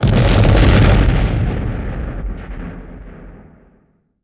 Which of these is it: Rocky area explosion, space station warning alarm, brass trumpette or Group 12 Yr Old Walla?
Rocky area explosion